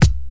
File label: old school airy kick drum sample c sharp key; Duration 0:01; Samplerate 44100 Hz